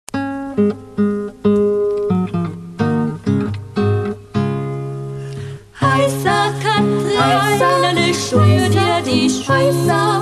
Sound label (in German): Gesang